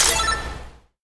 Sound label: Reload Beep